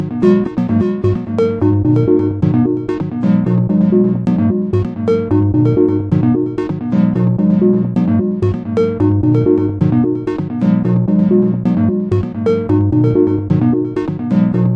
A Little Ant Calypso Parade mono, synth and electronic loops